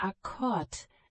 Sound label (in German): Akkord